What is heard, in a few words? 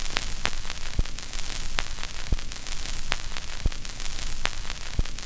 CRACK; E-Lab; X Static Goldmine 2; akai